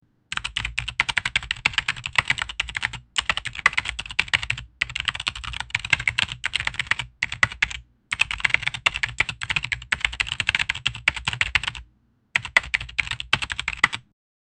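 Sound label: mechanical keyboard typing, clicking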